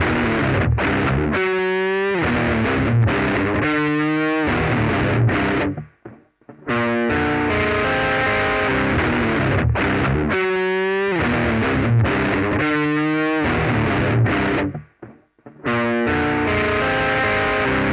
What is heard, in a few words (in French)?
guitare satu